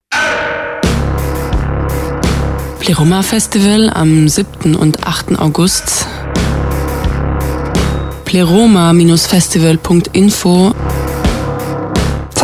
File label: Jingle; Pleroma